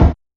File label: Rhythm Snare Kick Dark, Realistic Concertmate-670; Layered Kit